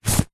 clothing shorts drawstring pull tighten; foley; zapsplat

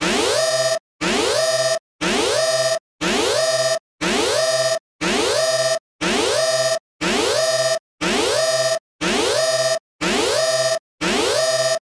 Alarms; soundbits

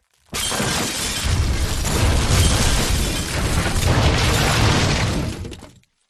impacts building collapse more glass

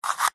battery tester plastic slider slide; foley; zapsplat

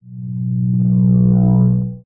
Swell Sci Fi Abstract Glowing Warm Short; skyes audio

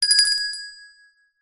bell small hand ring short

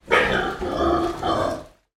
pig grunt inside barn wet sloppy mud

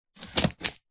cardboard box taped open knife slice; foley

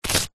stone small scrape in dry mud dirt; zapsplat; foley

